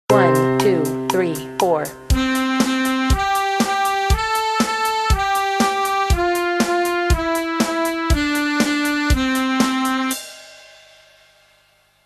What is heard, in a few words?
Twinkle Male